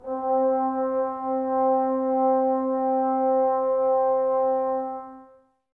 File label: Brass, Woodwind Brass Ensemble